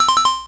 reload; mario

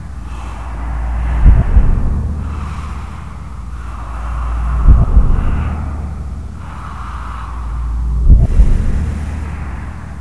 vision

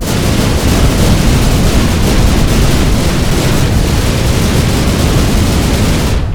metal barrel impact hard

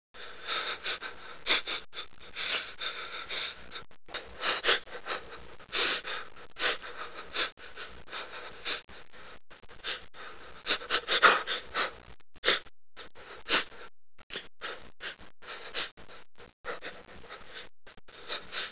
breathing scared

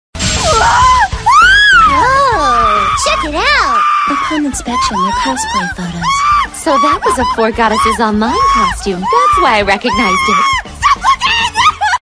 scream

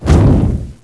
ignite

